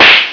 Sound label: slap hard